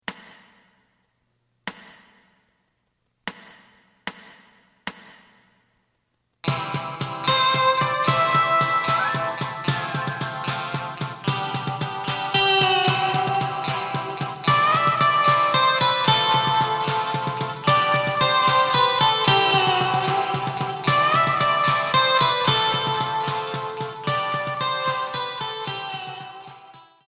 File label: bass